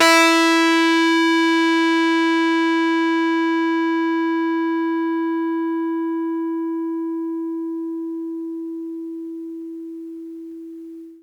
RHODES FUZZ; PlatinumPhattCD2; E-MU Producer Series Vol. 8 – Platinum Phatt

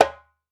WALK DRUM, African Drums

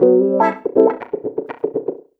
120 WAH WAH, Electric Guitar Loops

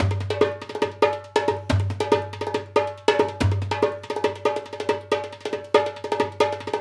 Dumbek; Essential Percussion

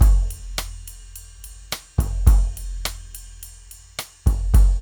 DRUMS, Funky Ass Loops; East West

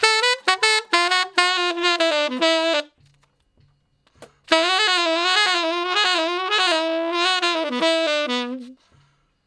Ten Sax Straight (B), R&B; Crimson Blue and Fabulous Horncraft 4 RnB; Solos

